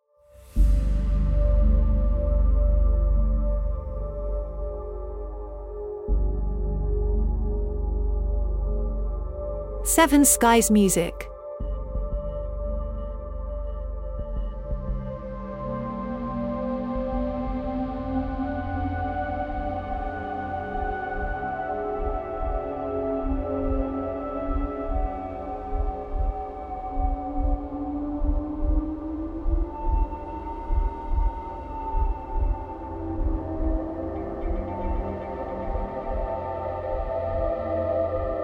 Dark Ambience

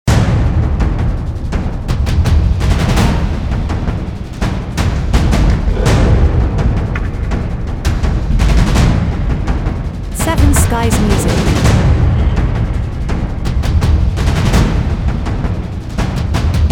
Apocalypse drum loop only

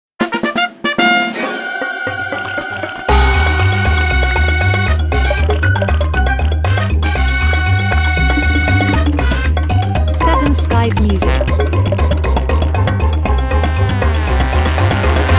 Funny Chase

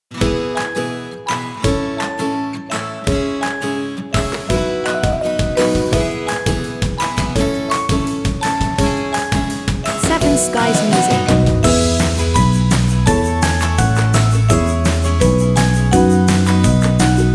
Cheerful Promo

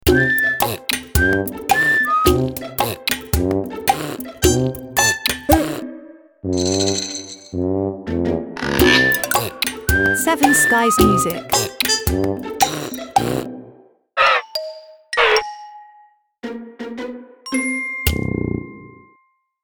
Whistling with no reason

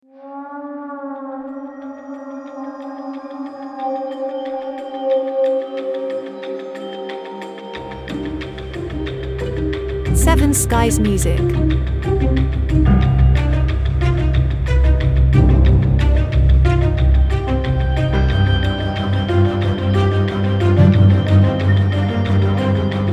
Big Hollywood Dramatic Score

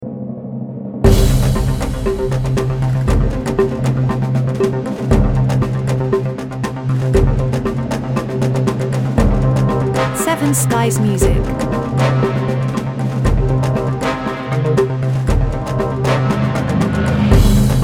tension

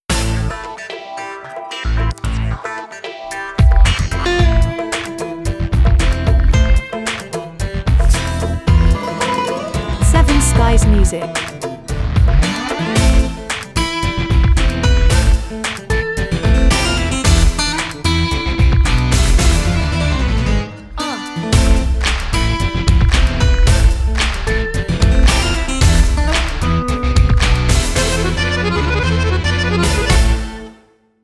Passion Tango

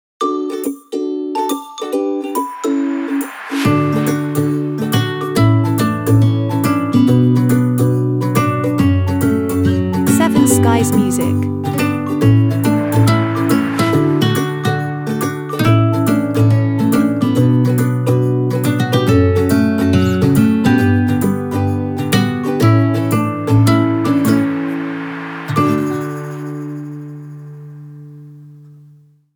Time To Relax, only shakers

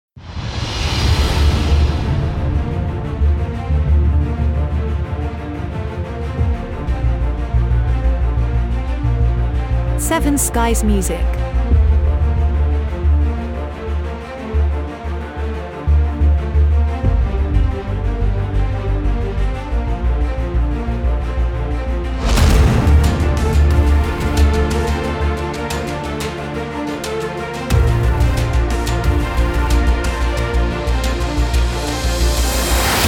Cinematic Space Attack

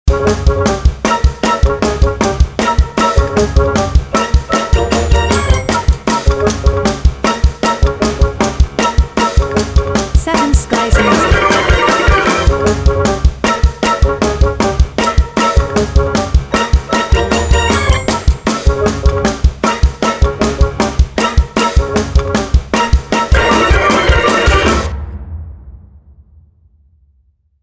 Cartoon Manic

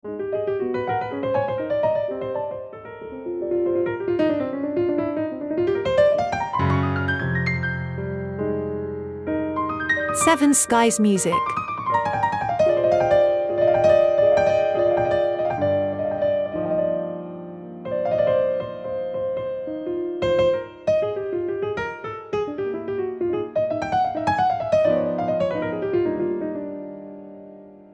Jazz Piano improvisation

